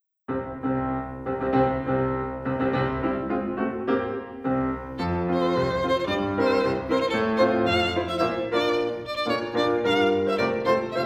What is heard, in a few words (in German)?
Marsch F dur